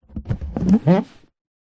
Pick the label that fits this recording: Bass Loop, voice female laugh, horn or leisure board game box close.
leisure board game box close